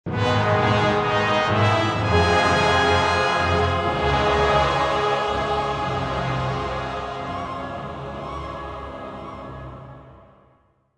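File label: Mission Fail; newworlds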